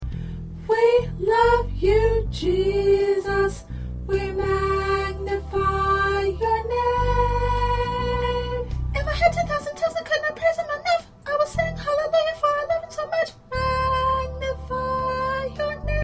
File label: Lead Soprano